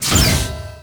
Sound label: Drop Hatch Start; Doors